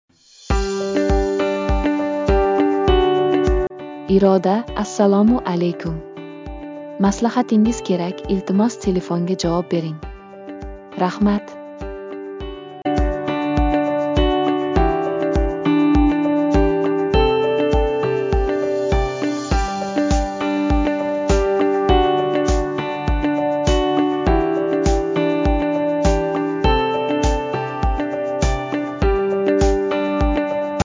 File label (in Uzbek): zvonok